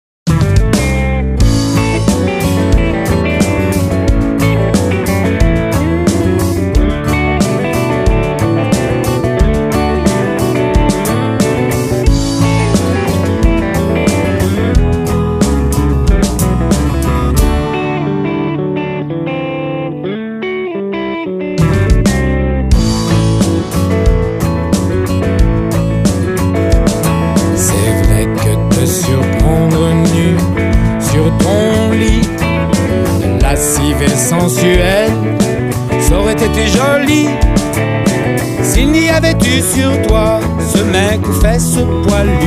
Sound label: Blues